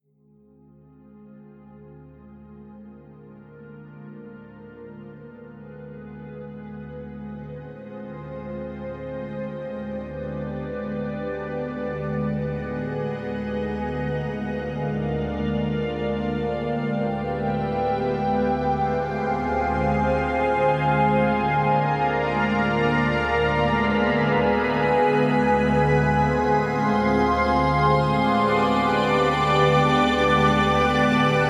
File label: chords; ravel